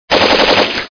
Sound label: machine gun; tankwars